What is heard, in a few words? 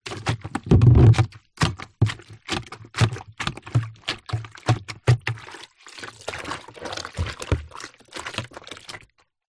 household mop in plastic bucket rinses movements